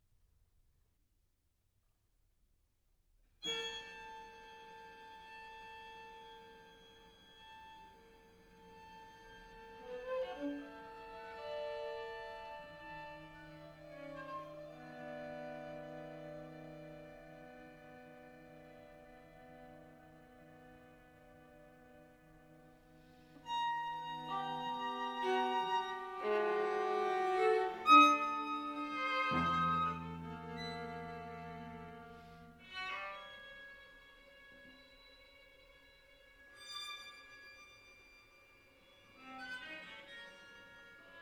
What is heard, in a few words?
Livre Pour Quatuor; Boulez